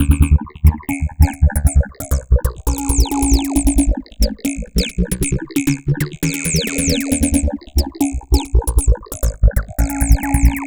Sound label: Drums Strange